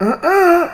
Incorrect; OverTheBrink

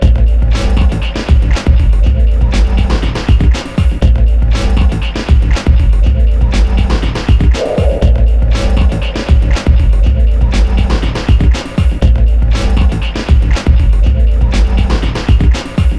Main Tune; Golden Boot Ultimate

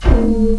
Score Down; ItsComingHome

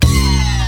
Bonus Wheel Symbol; JackpotDarts; ClubFusion

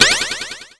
Correct Answer; GreatEscape3

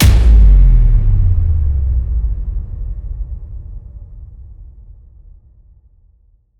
Stop; LuckyPunch; ClubFusion

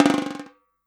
Stake Line Chang; Jackpot Darts

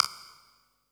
Trail Bonus Pick Noise; CashBomb

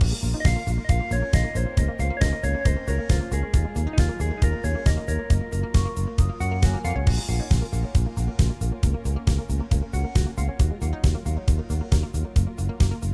main BG Music; World Masters Pro; Dart Sounds

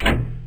Question Pop; PubQuizKnockout

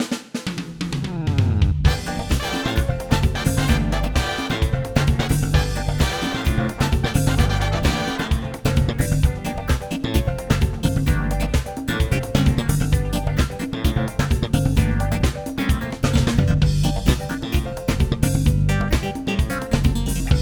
Bonus Wheel Music; Showtime POG; It's Showtime

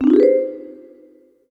Bonus Awarded; MexicanaChillitime; ClubFusion

